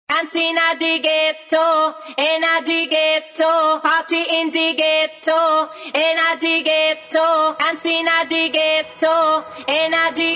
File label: Acap In